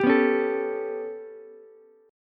B 7th Suspended 2nd Sharp 5th down Ukulele, chord; B7sus2#5 strummed; JGuitar

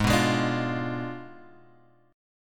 G sharp Diminished 7th G sharp; G#dim7 Chord {4 2 X 1 3 1} strummed

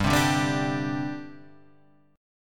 G Suspended 4th, chord; Gsus4 strummed; JGuitar

